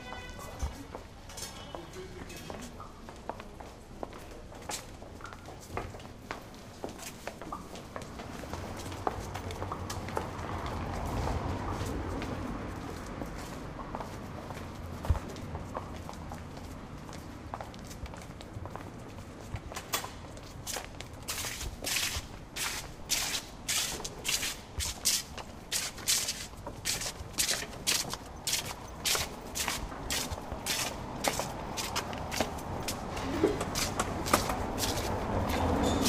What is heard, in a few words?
scratching the ground